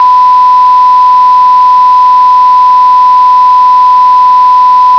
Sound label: 11KHz ADPCM Mono 1KHz Tone